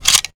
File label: weapon foley drop; Real Guns Sound Pack 2; Mono